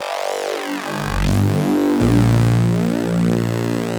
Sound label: Synth, Synth Textures